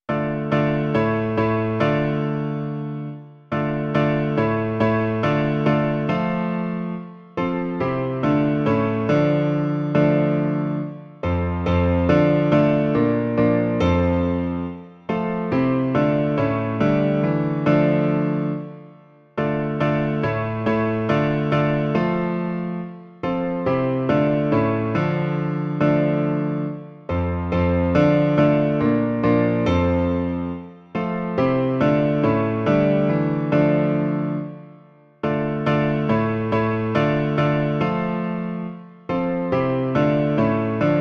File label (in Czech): Všichni věrní křesťané - klavír; Klavírní verze